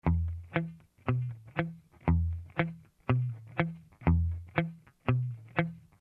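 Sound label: Alt Bass, finger style guitar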